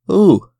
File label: Chorus surprise; Frogsong